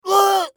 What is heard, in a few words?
scav wound; eft